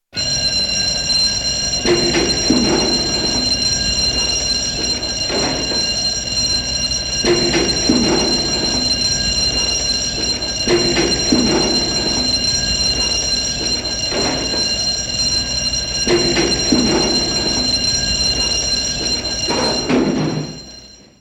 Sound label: Fire Station, alarm bell & fireman descend pole; Alarm Sound Effects; BBC